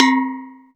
Synth Bells; Dream Zone

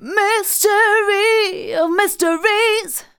vocals sung; Techno Trance Essentials

